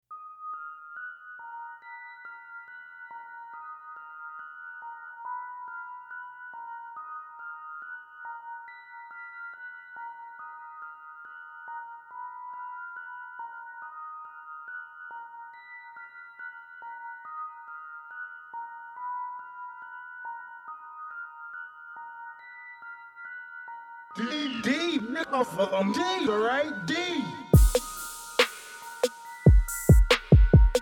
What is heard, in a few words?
Instrumental